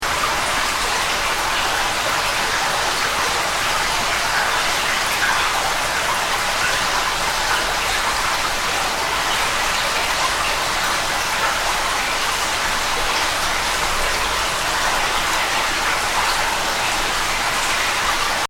Waterfall In Cave Loop, Reverberant water fall in cave sound; Seamlessly looping sound.; LYN108 package of Swamp, Marsh